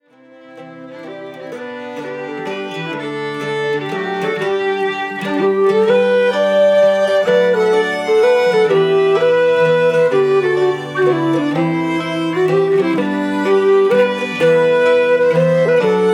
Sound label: Waltz